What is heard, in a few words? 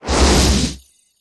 Abomination Ranged Magical; Kilta